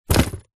shoes leather business womans throw down drop wood floor; zapsplat; foley